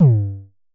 Festival Kicks - G2, Kicks, Vermona Kick Lancet; LookIMadeAThing Kicks

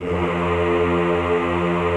EHH F1, Classical Choir; Best Service; Extended Classical Choir; EHH MIX

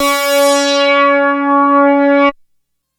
Obi Classic Pattern One Shot, synth; Sonic Foundry (Sony Creative Software); Electronic Point Blank

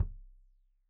Kik Stereo; Drum; Deeply Sampled Drums; SMDrum

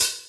Open Hhat, Hihat; Equipped Music; Loungin House

